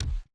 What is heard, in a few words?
reel bounce